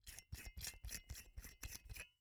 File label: Household Light Bulb Unscrewing Out Light Socket